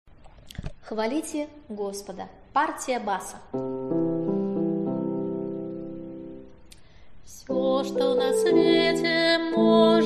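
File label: bass; Xvalite Gospoda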